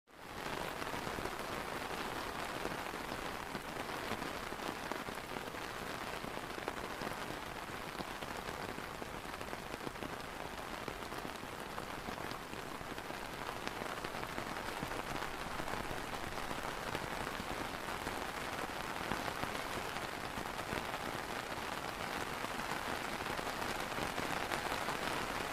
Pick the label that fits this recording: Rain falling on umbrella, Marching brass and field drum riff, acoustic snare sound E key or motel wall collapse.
Rain falling on umbrella